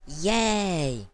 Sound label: Yay Sound